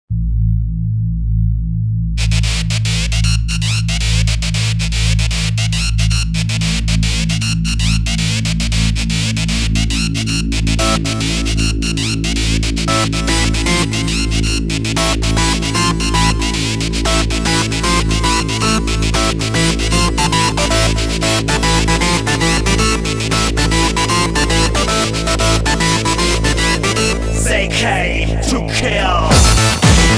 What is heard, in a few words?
electronic remix